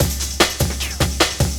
LOOP, Drum & Bass, 150 BPM

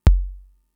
Kick, Drum Machines Fricke MFB-522

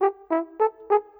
Horn, Orchestral; Sonic Foundry (Sony Creative Software); Orchestral VOL-2

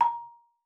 marimba A#5; Xsample VOL-4

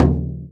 BASS, INDIAN PERC; Big Fish Audio - Didgeridoo and Other Primitive Instruments

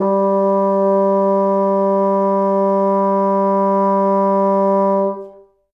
G2, Bassoon; Best Service ProSamples vol.51; Classic Orchestra 2

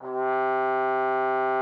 TROMBONE, C3; Xsample VOL-3

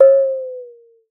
water; Xsample VOL-9

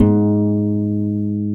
concert harp F#1; InVision Interactive; Lightware VOL-1; Instruments & Percussions

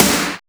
HEAVY SNARE; POP-DNC 2

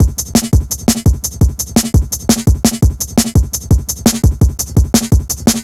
170, drum loops, drum & bass; 90 s Sample CDs; Total Drum&Bass

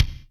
BD Rock, Yamaha DTXpress Acoustic Kick

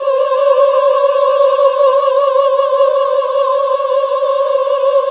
GOSP. Oooh, Roland; Sound & Vision - Gigapack II CD 1 (Roland); HAL Gosp. Oooh 2

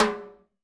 Hi W Verb, Alesis D4

